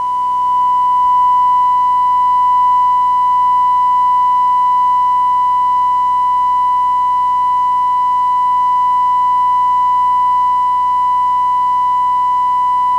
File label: test tone; portofseattle; seatac